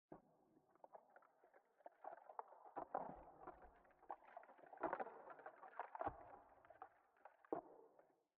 Ice cracking in glacier cave

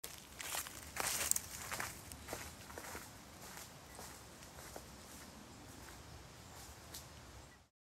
Walking Away

